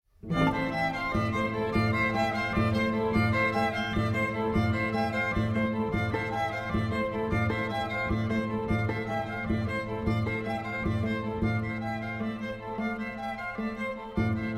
String Quartet; No. 1 Pictures From Warsaw I. Foundation

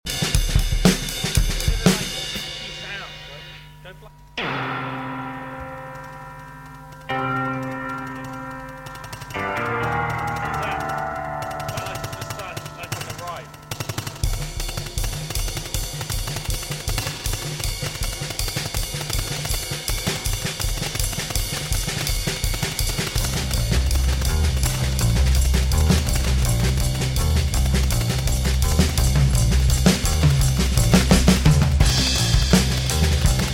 Drums Bass

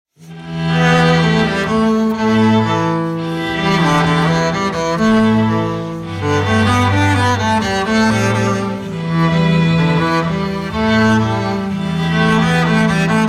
Acoustic

